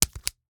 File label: Slap bracelet, smack, slap, whip, felt, plastic, hit, roll up, slide; Eiravaein Works; Vaeyan IV